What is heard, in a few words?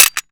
Pistol Collision; zps; Foley